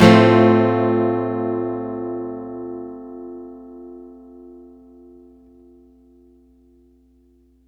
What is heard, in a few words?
One Shot Guitar - 125 BPM (F Major), Acoustic Guitar; Instruments; One Shots; GhostHack; Ghosthack - Free Guitar And Piano Samples